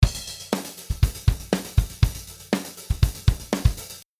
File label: Mini V Efx arpeggiator